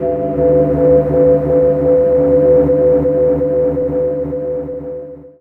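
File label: Pad Synth; Equipped Music; Loungin House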